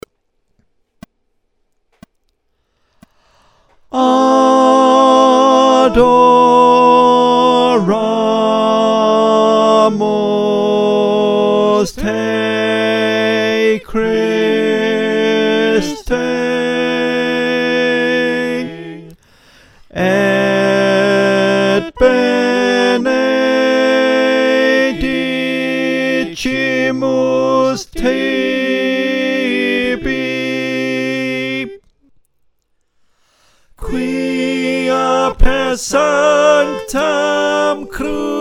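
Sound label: Tenor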